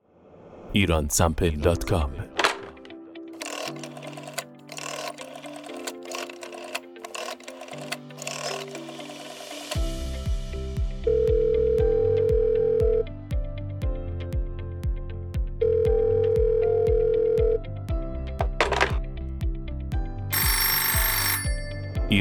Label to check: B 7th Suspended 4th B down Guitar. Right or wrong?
Wrong